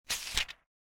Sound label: page flip